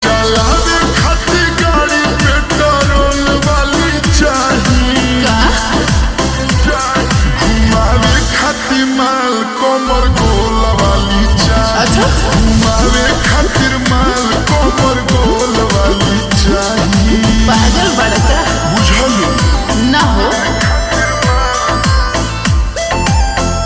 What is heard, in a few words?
Ringtone Slowed Reverb